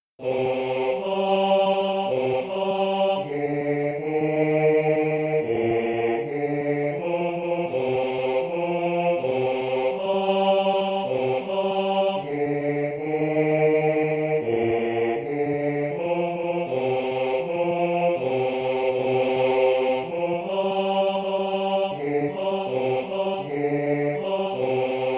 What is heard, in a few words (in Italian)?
Coro Basso; Willaert; O bene mio